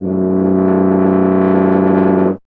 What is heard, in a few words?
BRS TUBA, Solo Instruments